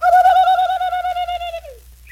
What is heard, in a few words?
ha la lay lee lee lee lee, Voice